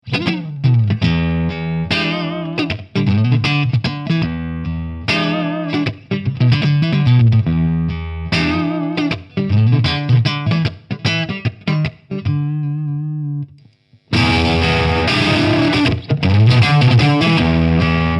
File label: Panama Single Coil Neck; Carl Martin